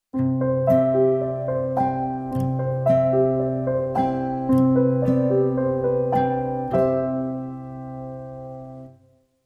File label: Alpine Romantic, Sting Reduced